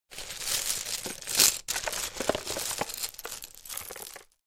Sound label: Jewelry clacking and rattling